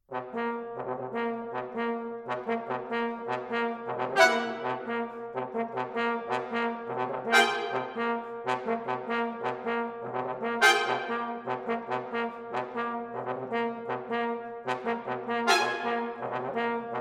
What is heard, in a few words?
Brass Quintet